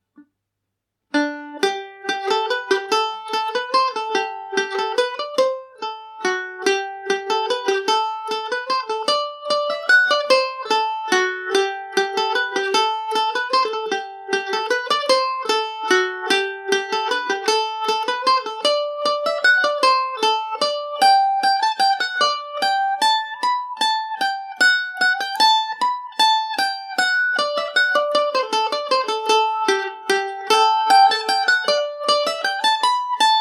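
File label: munster cloak G major revisited, mandolin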